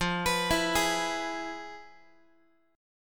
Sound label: F-Minor Major 7th Flat 5th, Guitar; FmM7b5 arpeggiated; JGuitar